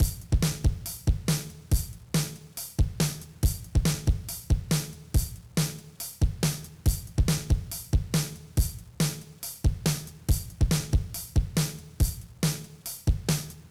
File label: drive; MPC6070